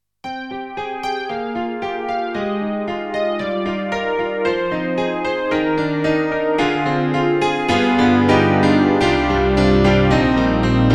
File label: Piano; KORG; N1R; PianoHaven